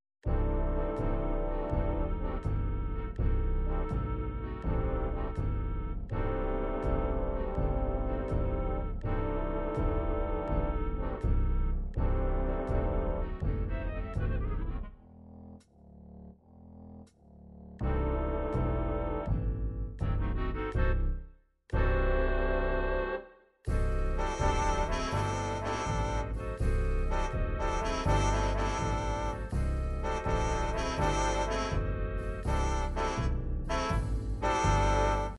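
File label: jazz big band ballad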